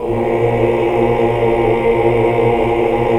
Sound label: AAH, AAH OCT; Best Service; Hallelujah